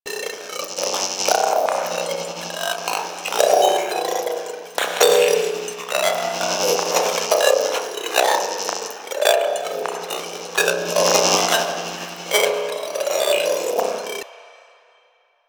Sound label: Infested TV Pile Loop; Warframe; Ambience; Nineteen Ninety Nine